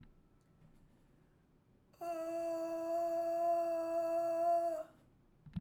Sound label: E4 head, Head Voice, singing